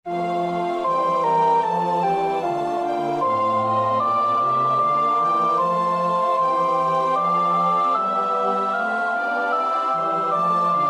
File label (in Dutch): sopraan, koorpartij oefening; Bach (Johann Sebastiaan); BWV 172; Von Gott kömmet mir ein Freudenschein